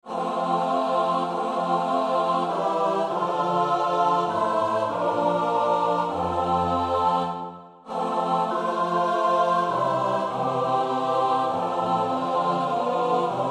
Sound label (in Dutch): Gezang S-A-T-B; Gesius (Bartholomaus); Oefenbestanden